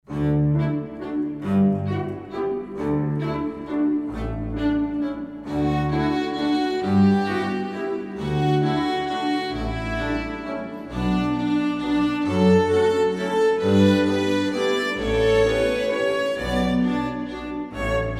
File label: Valse Elegante, Everything String